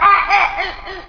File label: Jungle; The Wild Thornberrys Movie